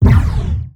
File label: laser fire, weapons; Stellaris